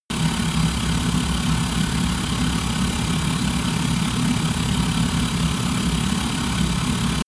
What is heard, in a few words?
spinning rattle, sciene fiction